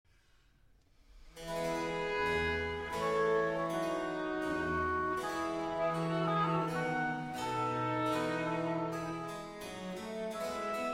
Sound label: Trio Sonata in D Minor, Adagio e dolce, for oboe, violin and basso; basso continuo; BWV 527